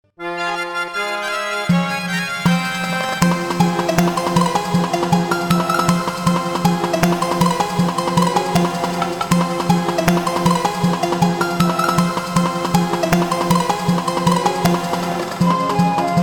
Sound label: enemy near; Distant mesa